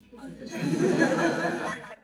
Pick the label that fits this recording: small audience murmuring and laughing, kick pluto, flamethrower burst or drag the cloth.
small audience murmuring and laughing